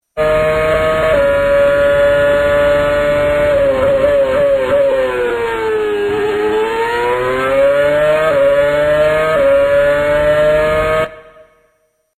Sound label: F1 Gears